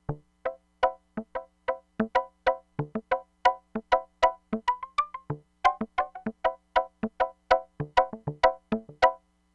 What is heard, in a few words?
ORGAN 5th PERCUSSION, Crumar Bit 99; Factory Programs